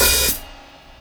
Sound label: long open hat c key